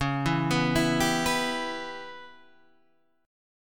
Db Minor 7th Flat 5th Db Guitar Standard; arpeggiated; JGuitar

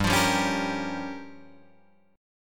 G Minor 7th Flat 5th, Guitar Drop D

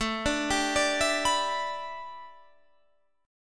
A 9th Suspended 4th Guitar Standard, chord; A9sus4; arpeggiated; capo=2

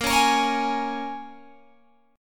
A sharp Minor 7th, A sharp, down, Guitar, Open G

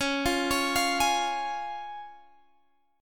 C sharp Major, Guitar, Standard; arpeggiated; JGuitar

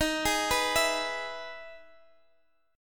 E-Major D sharp

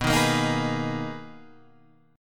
B Major 13th B Guitar Open G

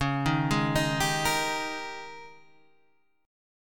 Ab Major 7th Suspended 2nd Suspended 4th Db

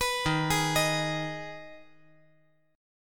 D sharp Suspended 4th Sharp 5th

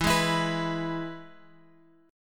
E Major, down Guitar Open G

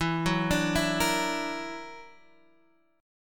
F sharp Augmented 7th E, chord

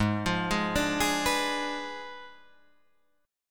G sharp Diminished 7th G sharp, Guitar Drop D

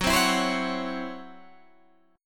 Db Minor Major 7th Double Flat 5th Gb

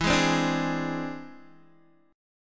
E Augmented 7th E down Guitar DADGAD

